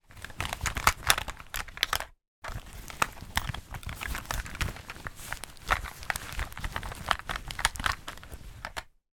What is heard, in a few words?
foley makeup pouch fabric hand rummage inside items movement